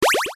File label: pickup; ninja gamma